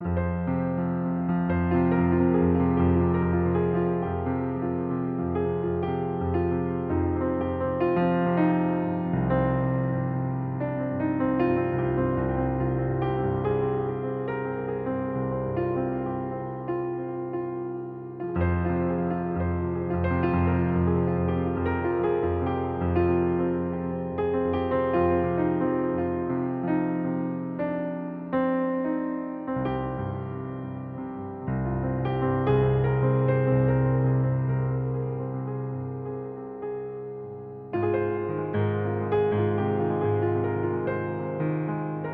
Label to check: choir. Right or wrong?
Wrong